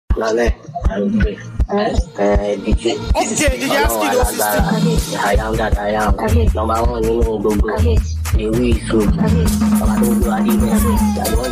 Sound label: Olorun Alagbara Mara Beat